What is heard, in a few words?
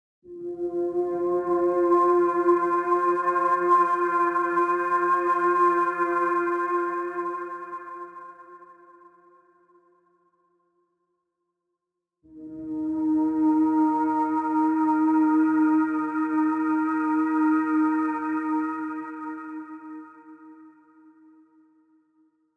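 Day Ambience Stinger; dying light gamerip 2015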